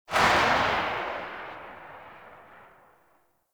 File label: Rifle Tail, weapons; Gyre